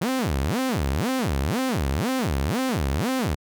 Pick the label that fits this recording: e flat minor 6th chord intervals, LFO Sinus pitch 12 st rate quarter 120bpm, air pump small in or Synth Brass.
LFO Sinus pitch 12 st rate quarter 120bpm